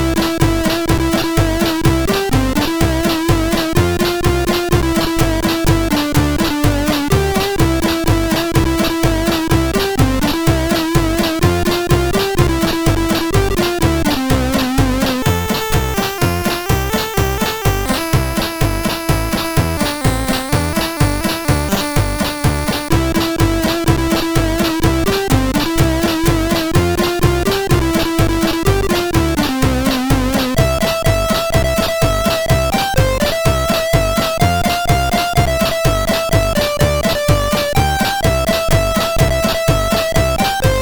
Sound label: Jingle Bells sid MOS6581R4; hvsc